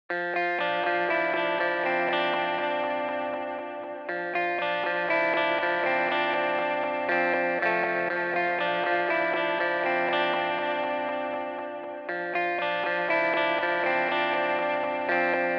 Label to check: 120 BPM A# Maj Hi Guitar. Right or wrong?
Right